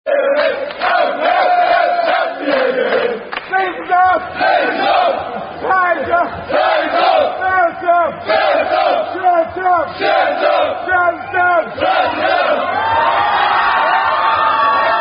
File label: Unknown German Rugby Song; Austrian Rugby Team Public Striptease In Vilnius